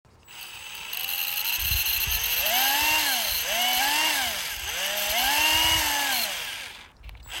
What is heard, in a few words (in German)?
geraeusch